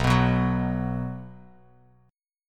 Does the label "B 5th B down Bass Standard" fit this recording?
Yes